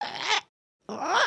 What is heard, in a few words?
stretch, emotes